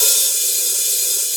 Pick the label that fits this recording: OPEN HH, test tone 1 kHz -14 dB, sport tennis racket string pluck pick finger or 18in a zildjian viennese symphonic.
OPEN HH